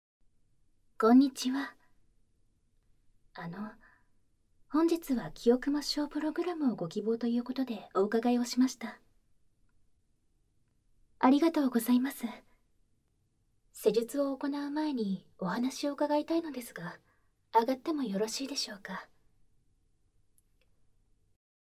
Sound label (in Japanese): 耳舐め; ASMR